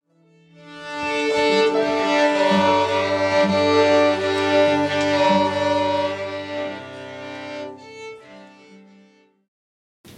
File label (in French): Ouverture instrumental